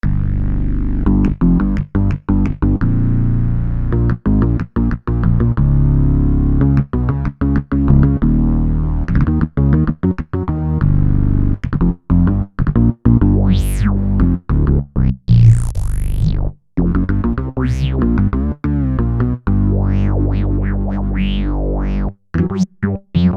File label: Rasp Bass